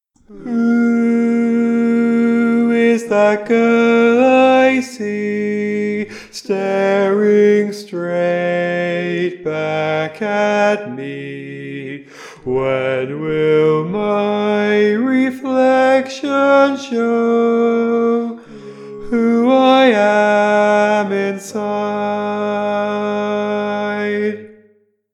Lead, barbershop tags

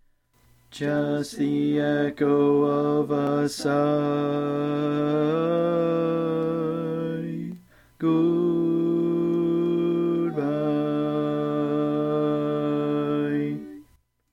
Bass

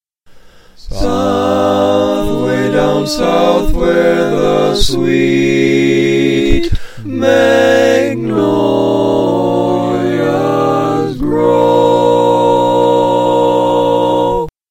Magnolias, All Parts, barbershop tags

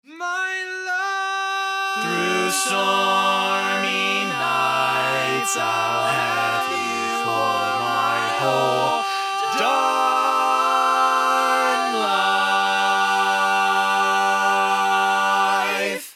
Tenor, barbershop

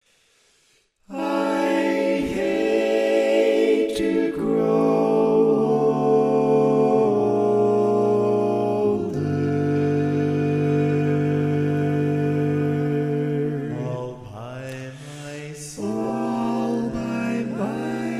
Bari, barbershop

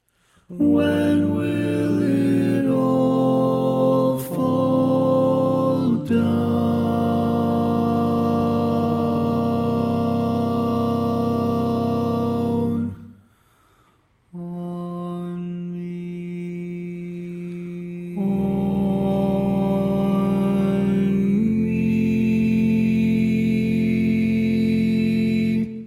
Tenor

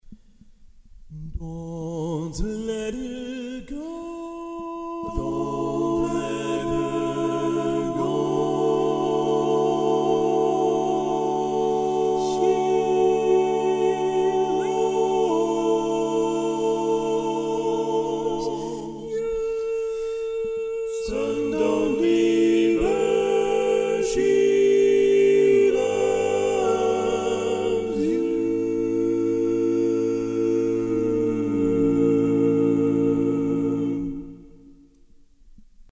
barbershop tags, all parts

